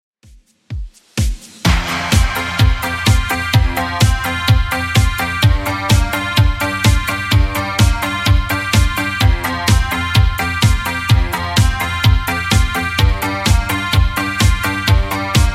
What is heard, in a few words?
80s Redrum 127bpm